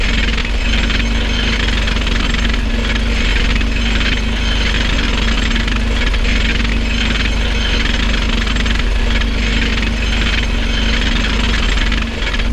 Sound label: tank drill long no rocks; ambient